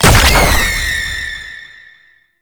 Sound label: Infested Lich Summon Tentacles Cast; Warframe; Abilities; Zeke